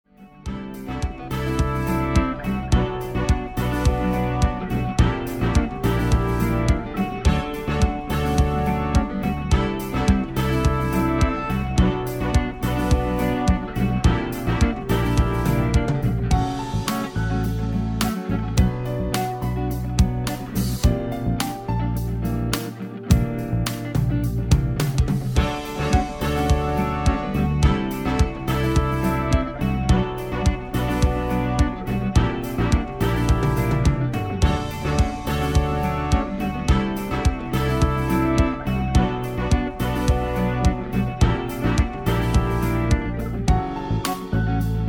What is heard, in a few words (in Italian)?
Dialogo solo base